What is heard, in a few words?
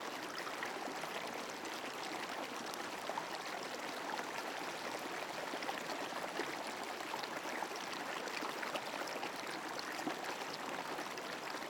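Ambience China 3D Ambience; rabbids multiverse; iOS, macOS, Mobile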